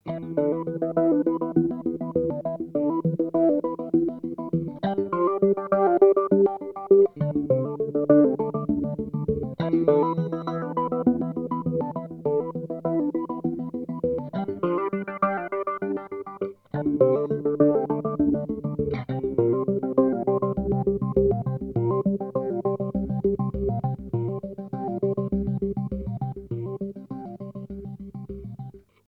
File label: Boss SL 2 SFX